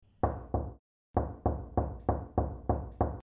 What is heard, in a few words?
Tapping Sequence